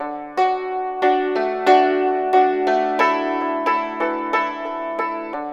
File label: YNCHIN 90 D; INIS - Opium